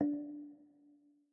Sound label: Move D 3 Mf